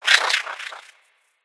Sfx Tennis

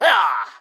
Damage; Pecheneg Red

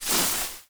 Plant Turret Emerge

